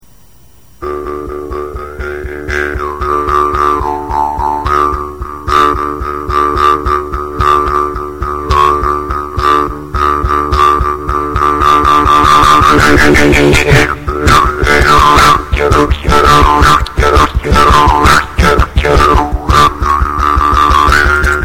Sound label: jews harp